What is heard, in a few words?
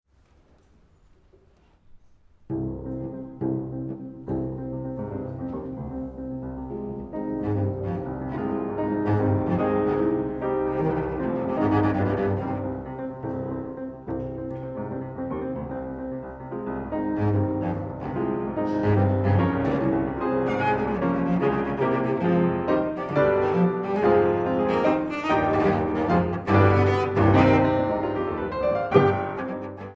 Sonata for Cello and Piano in G Minor II Allegro scherzando LIVE; Rachmaninoff; Op19